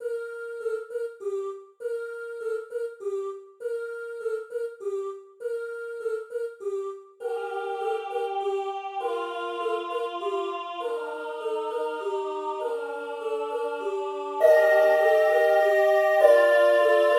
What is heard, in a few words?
Carol of the Bells sop; practice music